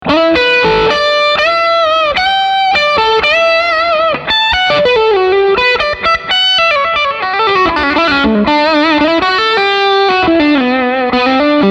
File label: Overdrive